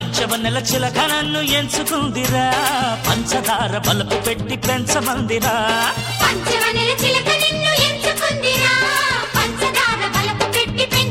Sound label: krishna item song, ringtone